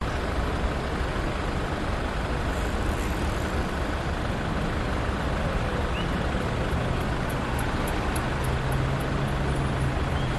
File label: Traffic Sound Cars Bicycles Brakes Motorsounds; Budapest Hungary; your free sounds